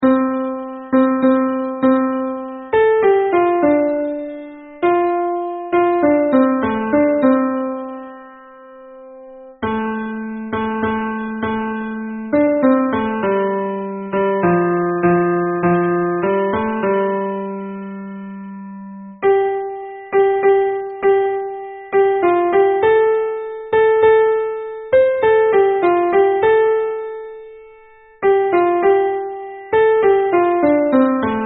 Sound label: F dur piano